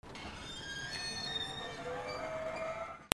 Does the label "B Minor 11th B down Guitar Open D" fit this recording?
No